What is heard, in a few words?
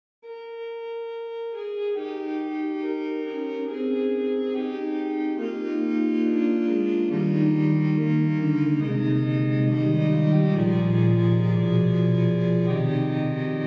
Noel, Organ Music, Souvigny; Boely